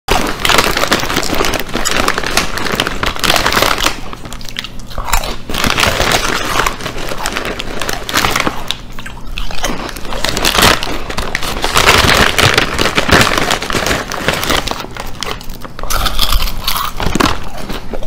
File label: Man Eating Chips (Loud ASMR)